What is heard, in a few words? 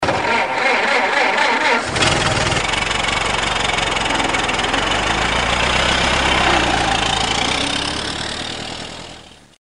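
sound of old car engine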